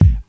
sharp steel kick drum sample a key; kick drum samples

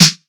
smooth acoustic snare sample f key; snare drum samples

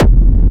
Industrial Techno Kick oneshot - F; f major

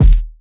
perfect kick drum single shot D sharp key

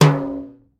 acoustic tom drum sample d key

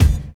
sharp bass drum sound d key; Duration 0:01; Samplerate 44100 Hz; Channels Mono

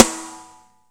prominent steel snare drum sample e key

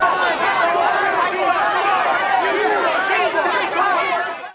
indistinct clamoring

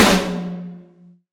boomy snare sample f key; snare drum samples

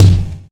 punchy kick drum single shot F sharp key

